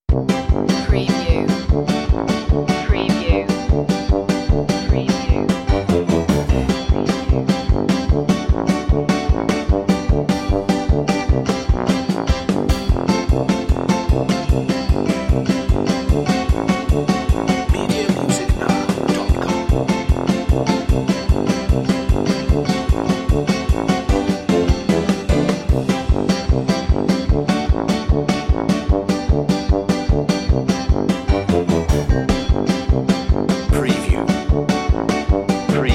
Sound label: cartoon mood underscore